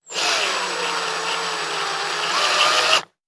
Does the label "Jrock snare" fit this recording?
No